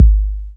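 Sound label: bd, 808 bass drum; Best Service Gigapack 2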